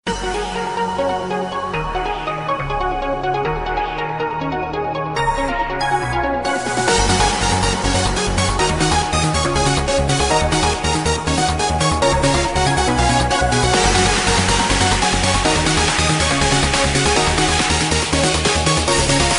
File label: Nokia Swimming Remix